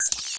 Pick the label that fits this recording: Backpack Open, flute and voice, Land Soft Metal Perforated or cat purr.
Backpack Open